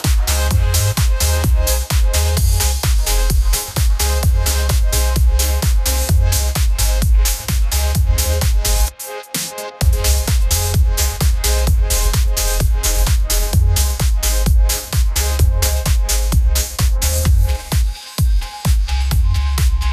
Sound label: ringtone edm